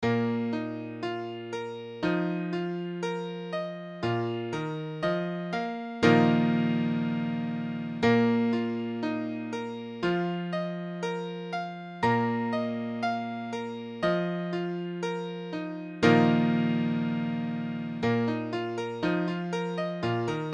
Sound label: piano, Bbsus4